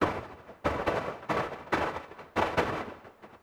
TEKNO 140; Techno

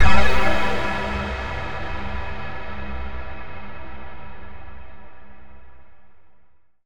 TECHNO FX 140

